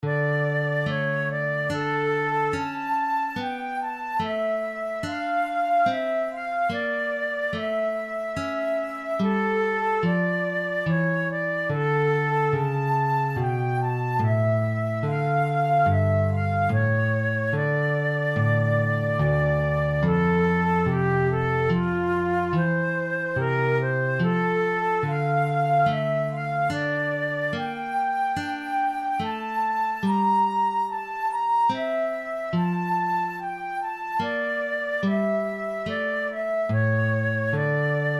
air in d minor, flute; purcell; the double dealer

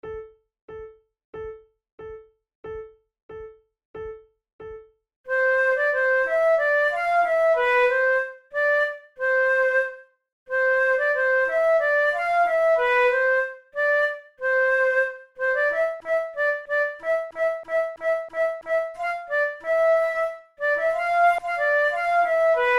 duet, flute tunes

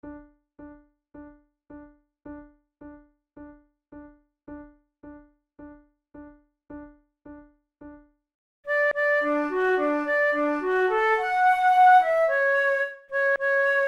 duet, flute

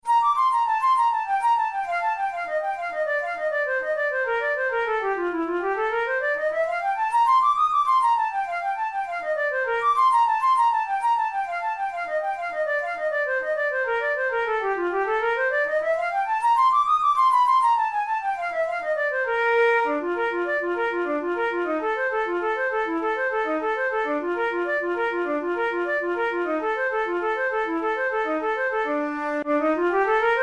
methode pour la flute study; drouet

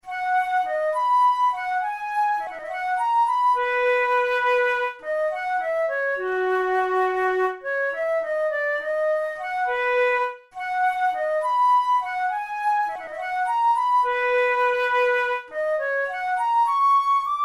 studies for the boehm flute; drouet 72

